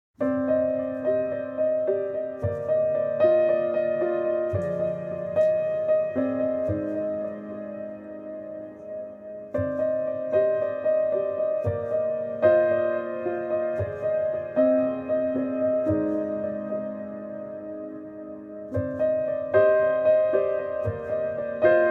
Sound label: Dream Sequence